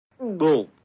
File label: death; freak fortress 2; goofy